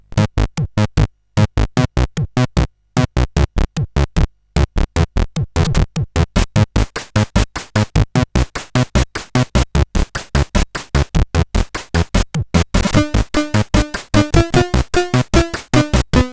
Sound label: Sad End, CSG8580R5